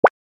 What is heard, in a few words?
pick up tiles; tekton